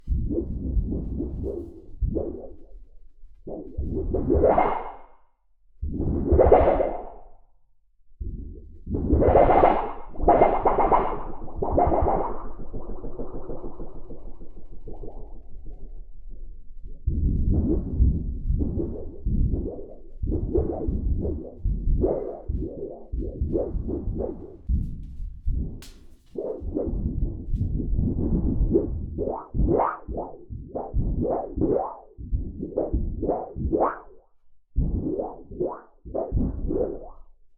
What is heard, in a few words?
Storage Heater